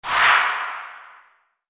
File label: Copper Whoosh; Whoosh Sound Effects; Echoes